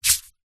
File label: human hands rub together dry single remove dirt